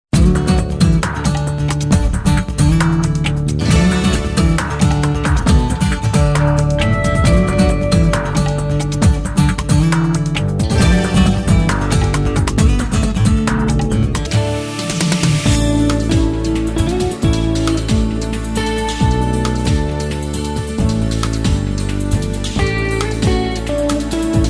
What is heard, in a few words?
Music Sample; On Hold